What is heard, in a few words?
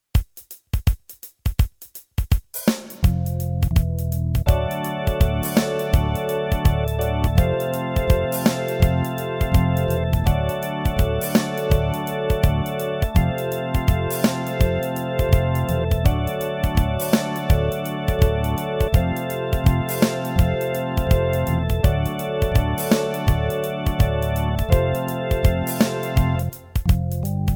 Prelude; hyper terrestrial; Choose Your Poison